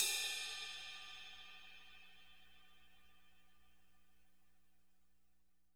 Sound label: crash tip